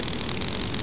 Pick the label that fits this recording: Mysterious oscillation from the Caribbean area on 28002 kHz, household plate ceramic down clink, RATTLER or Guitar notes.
RATTLER